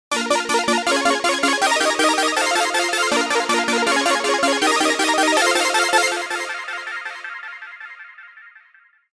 digital echo

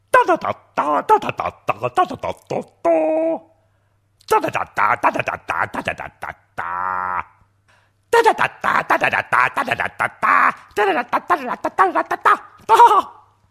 nokia funny ringtone

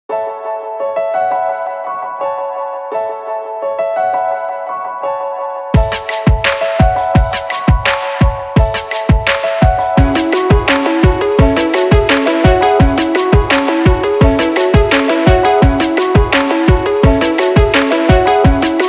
electronic beats ringtone

